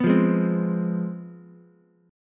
C Major 7th Suspended 4th Sharp 5th, down, Ukulele; CM7sus4#5 strummed; JGuitar